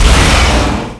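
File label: tank attack; quake2; mnhcoop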